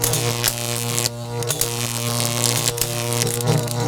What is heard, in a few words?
electric hum huge; Max Payne